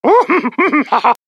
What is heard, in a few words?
"OOOHHHOHO"